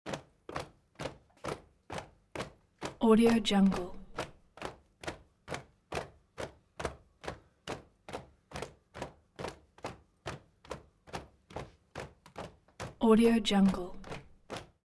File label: step crowd march quiet sequence; foley; smartsoundfx